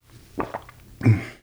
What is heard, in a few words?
drink; Singles Flirt Up Your Life